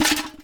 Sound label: Objects, Household, Metal Bucket, Old, Rusty, Set Down On Concrete